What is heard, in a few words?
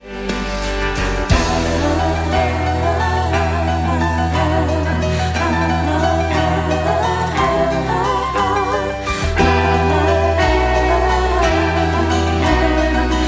interlude ringtone